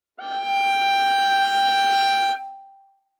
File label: Viola arco ff sul G G5; MIS Pitches - 2014; Strings